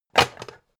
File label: pan stack hard